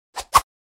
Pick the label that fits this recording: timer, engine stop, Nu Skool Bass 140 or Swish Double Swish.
Swish Double Swish